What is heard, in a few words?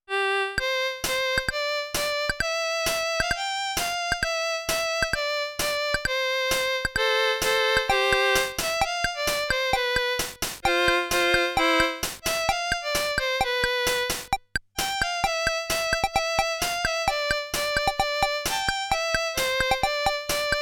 Casio CT S1000V Classic Tones